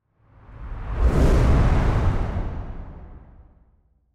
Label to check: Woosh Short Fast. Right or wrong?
Right